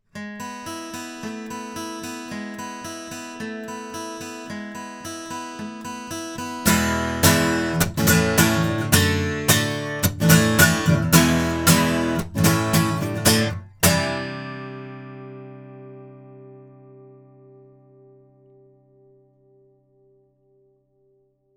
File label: Neumann TLM103 guitar